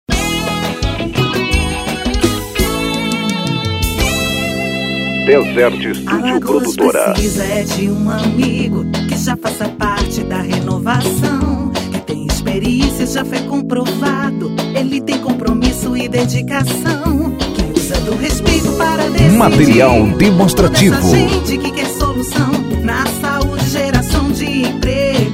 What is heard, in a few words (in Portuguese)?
Jingle